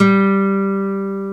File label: NYLON G 2B, GUITAR; AKAI S-Series CD-ROM Sound Library VOL-8